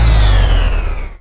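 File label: Train stop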